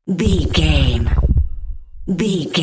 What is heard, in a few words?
dark implod